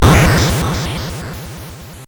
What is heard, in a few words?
Dark Castle, CSG8580R5